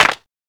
Clap Single Shot E Key, clap single hit tuned to the E note; single hits one shots